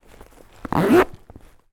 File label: handbag pocket zipper; foley